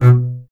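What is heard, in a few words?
STR CBS, Solo Instruments, double bass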